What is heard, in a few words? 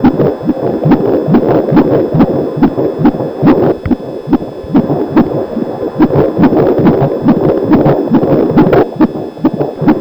minimooo doppler filtered